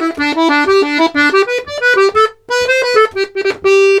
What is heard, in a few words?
GIGUE, Accordions; USB Soundscan vol.40; AKAI